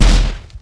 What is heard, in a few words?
explosion; IdleRPG2024